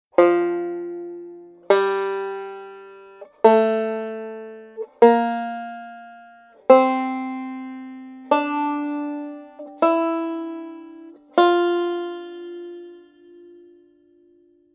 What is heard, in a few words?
F Scale